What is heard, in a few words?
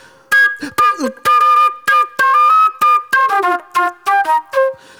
NY 70's Jazz Flute